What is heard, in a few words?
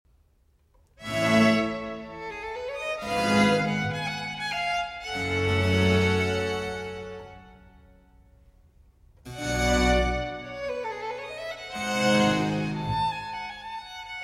Concerto in F Major For 4 Violins